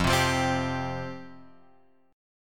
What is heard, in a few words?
F Suspended 2nd, chord; Fsus2 Chord {1 3 3 X 1 3} strummed